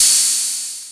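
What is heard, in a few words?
MS-20 Open HH; Digital Sound Factory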